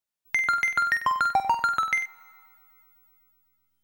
Bell; Daikaijuu Monogatari 2; The Magical Land Shelldorado

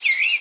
bird random

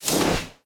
Net Hit Zombies

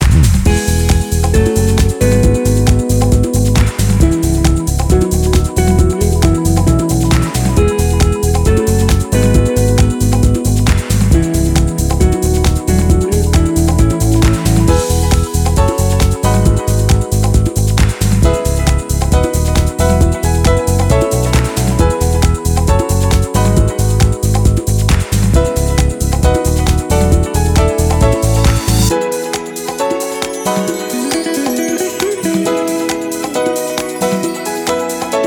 Prize Bronze Jingle; Gran Turismo Sport; Transmission Mode; Christmas Extra

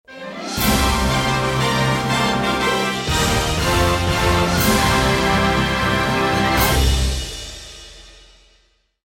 Trial Success; active life outdoor challenge

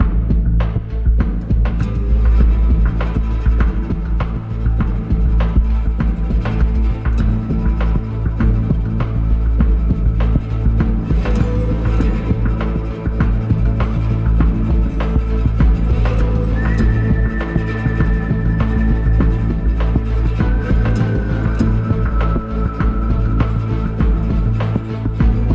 Low Threat Loop; Route99

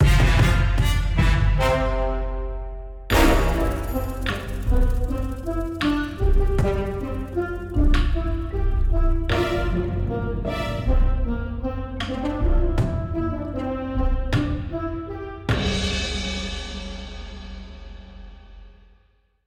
Comic BGM Half Win; conan chop chop ps4 switch windows xbox one gamerip 2022

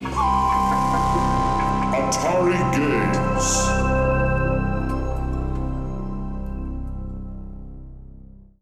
Logo (Arcade Version); Atari Games

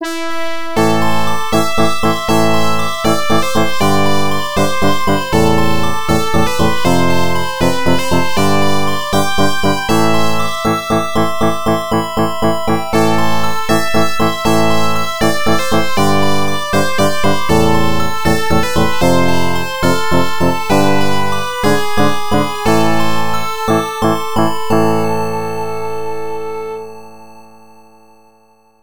Funeral March (Game Over); Herzog; PC-88

